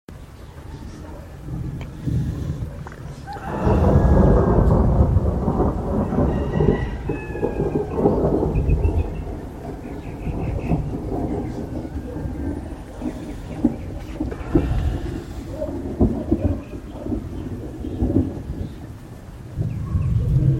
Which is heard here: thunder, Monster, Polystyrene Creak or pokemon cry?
thunder